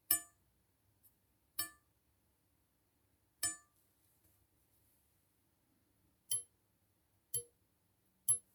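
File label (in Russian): задевание ножа пальцем; Звук ножа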